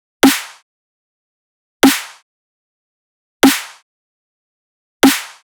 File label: Dubstep Kit 150bpm Snare; EDM Drums; Drum Kits; Stems; Ghosthack; Drum Mega Bundle; Ultimate EDM Drums; Dubstep Kit 16